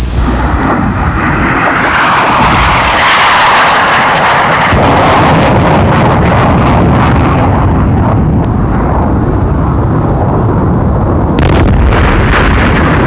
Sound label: flyby napalm